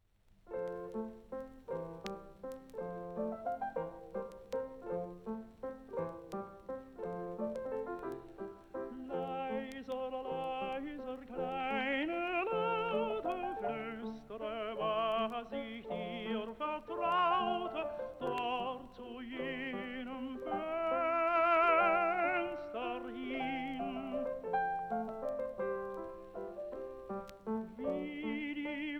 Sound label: tenor; Schubert; D. 905; Lieder on Record 1898-1952